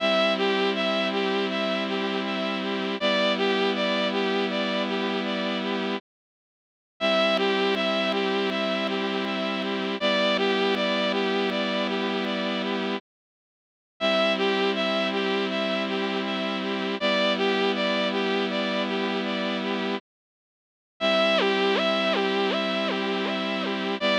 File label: tied notes example